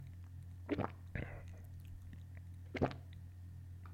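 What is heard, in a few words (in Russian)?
звук глотания воды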